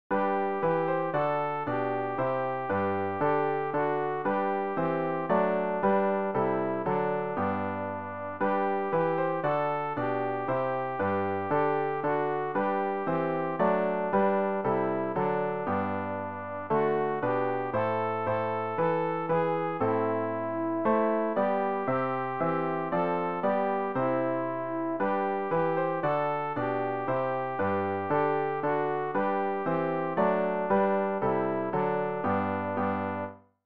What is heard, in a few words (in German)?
alt, Übehilfen für das Erlernen von Liedern; ref gesangbuch; rg 175 sorge herr fuer unsre kinder